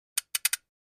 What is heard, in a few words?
Flash Gear Short Slow